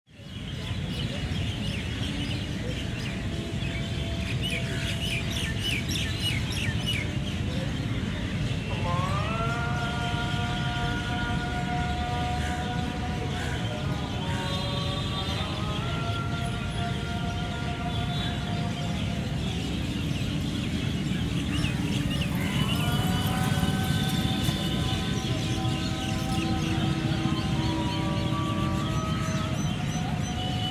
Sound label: City park birds traffic bell of a temple and muezzin from a mosque the evening, North India; Charlie Atanasyan; North India sound library by Faunethic